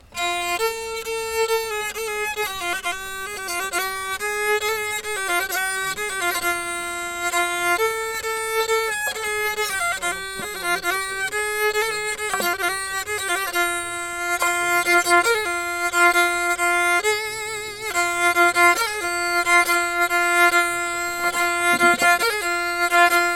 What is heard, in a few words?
tune